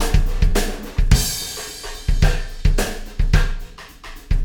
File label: Wormhole Beat, Processed Drumkits